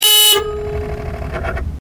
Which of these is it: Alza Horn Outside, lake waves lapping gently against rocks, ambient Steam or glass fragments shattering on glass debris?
Alza Horn Outside